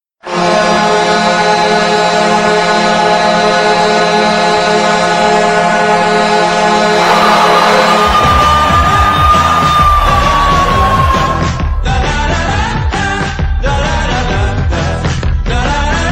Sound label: Horn